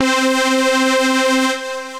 Synth Lead

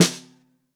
SN2 Bottom, Snare; Ableton Foundation; Snare Designer

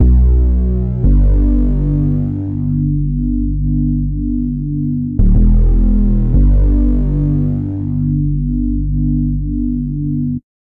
Bass C Major 90 bpm, Bassline; Tonal; MIDI Clips; Drive and Glow; Ableton; Factory Packs

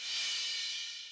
086 HipHop Cymbal; Drums; Cymbals

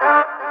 Vocals; Launchpad Mini MK2; Lightshow Pack Project

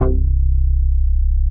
chirp plucks; Basses; Ableton; Creative Extensions; Poli

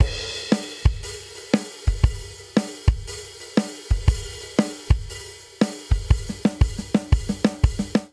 Blues Shuffle Outro 118 bpm; 4 4; MIDI Clips; Ableton; Factory Packs; Drum Booth

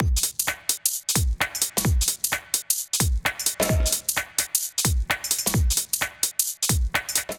Kit 130 bpm, Drums; MIDI Clips; Sol Hard Kit; Ableton; Factory Packs; Skitter and Step

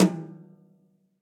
Tom Brush High Club Sizzle; drum hits; Ableton; Factory Packs; Session Drums Club; Standard Hits

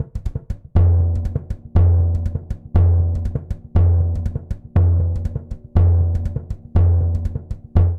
Baion B Surdo-120bpm; Latin Percussion; Ableton; Factory Packs

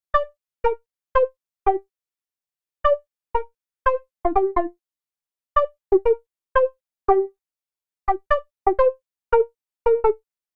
Pluck G Minor 88 bpm; MIDI Clips; Tonal; Melodic; Please Pizza Pluck; Ableton; Factory Packs; Chop and Swing

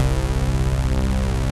Wavetable Bass; Run The Tables

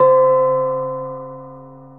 Gong; Cymbal

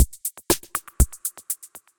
Kit-D55, Effected Drum Kits; Kit-D55 Klondike; Ableton; Factory Packs

